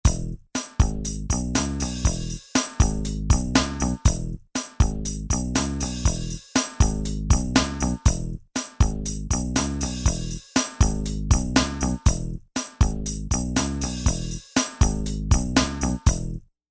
Rock

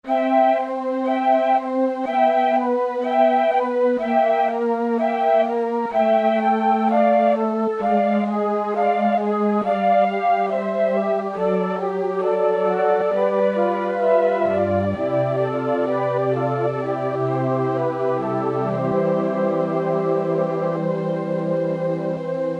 Raspberry, synth

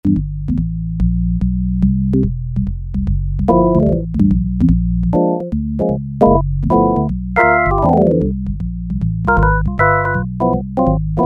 Jazz B3, Peavey DPM Spectrum Bass

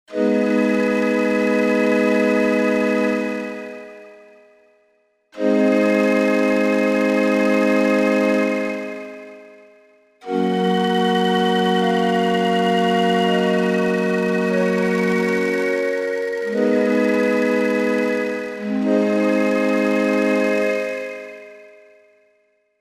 Effect Pad; Korg MSC-1S Variety

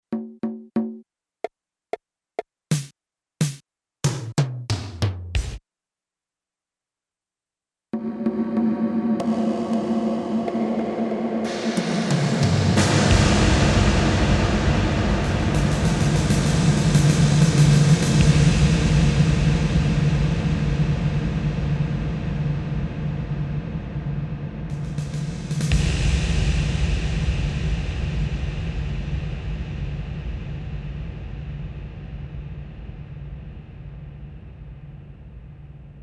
18 Sec. EX. LARGE BRIGHT, Alesis MidiVerb

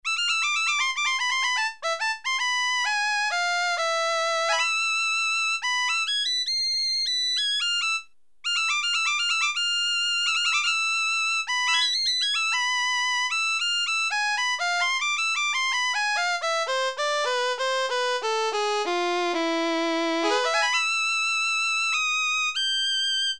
VIOLIN, Casio CZ-1000; Preset

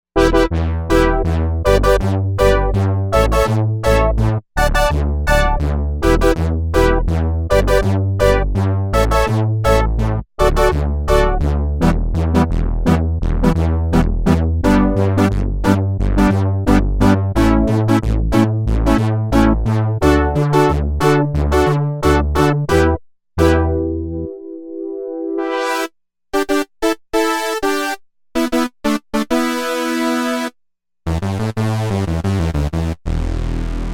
Egad Brass

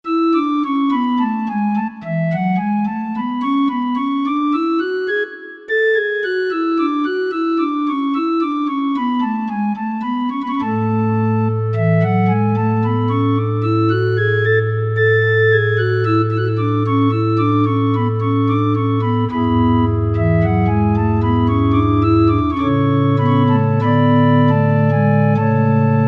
Positiv, Korg MSC-9S Organ

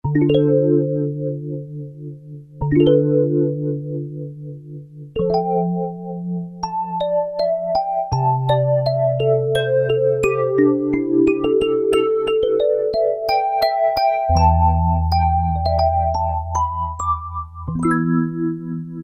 Stick Vibes, Roland MKS-70

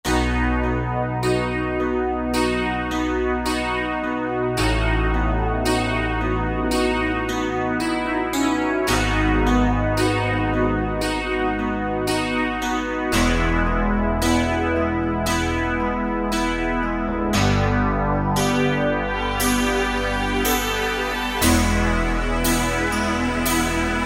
Midi Stack, Roland JD-990; Factory Patches

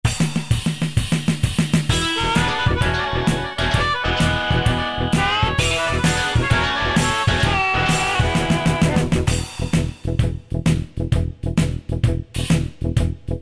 Pub Rock, Yamaha QY70; Preset Styles

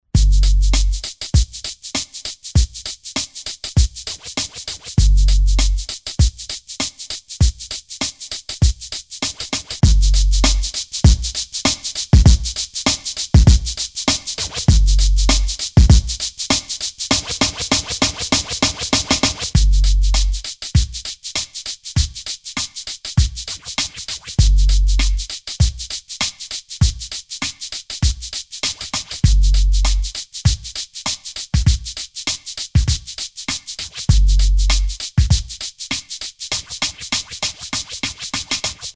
Nuskool Beat, Akai MPC1000

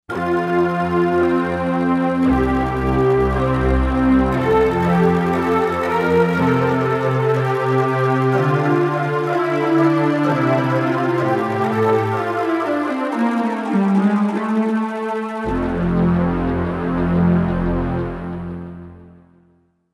Lo-Fi Rattle Pad; Roland SC-880; Factory Patches

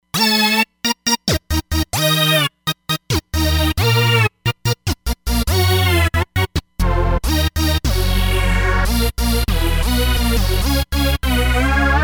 Nu Hoover, Roland Fantom XR

